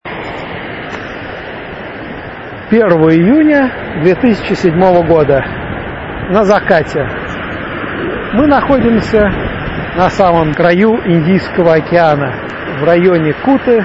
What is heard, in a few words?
indonesia sounds; geopoesia